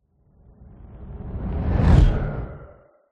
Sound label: fantasy magic spell zap whoosh impact dark airy